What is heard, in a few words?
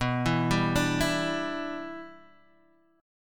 E Minor 7th B